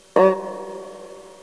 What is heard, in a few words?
Thomp